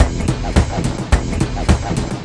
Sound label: 107 bpm grunge groove, drum loops and breaks